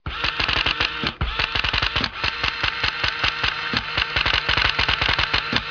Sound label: office